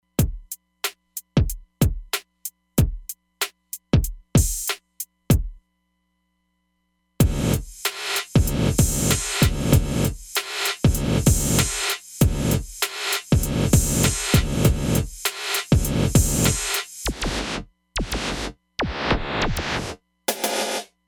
Panning Inverse Room, Roland DEP-5; Factory Patches

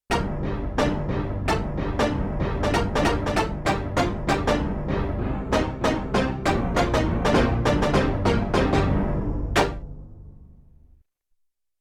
Orch Hit dual, Yamaha TX16W; Orchestral Performance 1

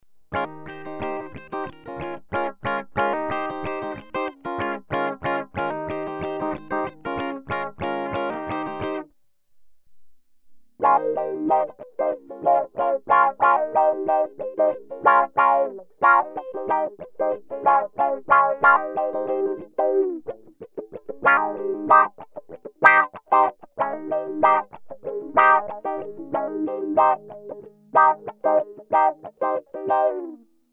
BOSS Dynamic Wah AW 3

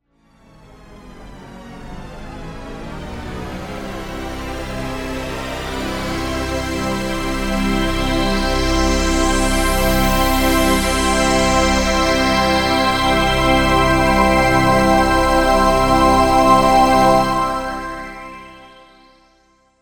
BIG ASCENT

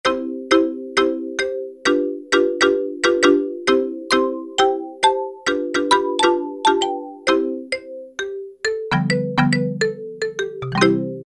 Wide Marimba; E-mu Proteus-1; Presets

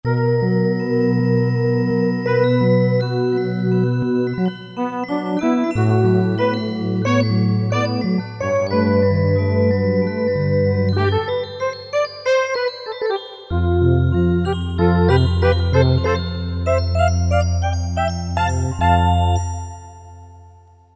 Echo Bell, Roland MT-32

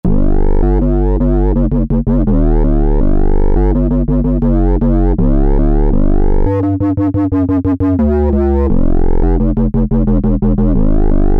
Drive Bass, Korg MS2000; Factory Patches

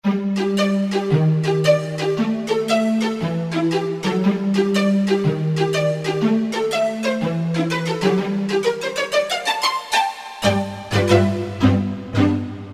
Pitzpan, Korg N364; Factory Prog demos

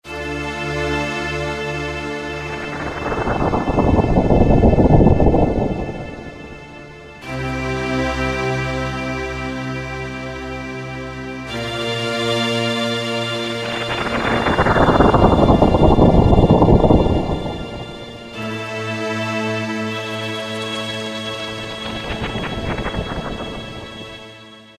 Thunder, Kurzweil K2000; Factory Presets; PressForThunder!; Kurzweil K2000 V3

